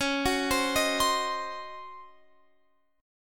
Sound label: C sharp Major 7th Suspended 2nd Suspended 4th, Guitar, Open F; C#M7sus2sus4; arpeggiated